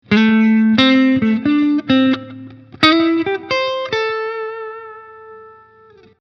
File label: Lick